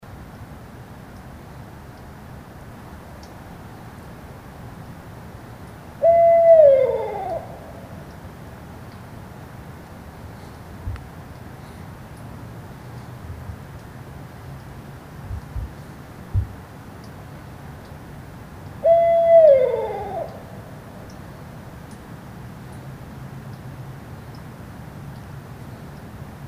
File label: Barred Owl Strix varia